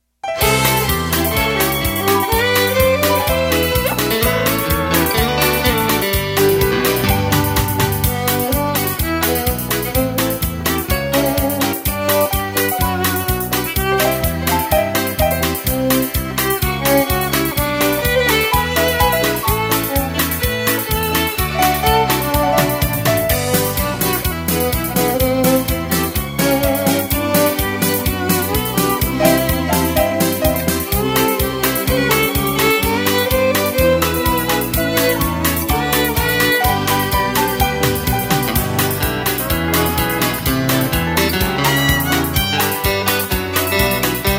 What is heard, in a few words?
music